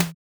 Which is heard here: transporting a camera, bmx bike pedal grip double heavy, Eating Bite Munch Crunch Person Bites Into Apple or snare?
snare